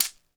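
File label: rattle, percussion; ILIO; Synclavier Percussion 2; World & Orchestral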